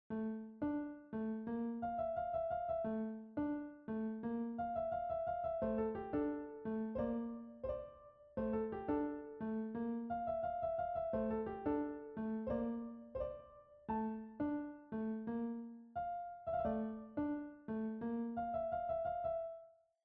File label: Piano Visualization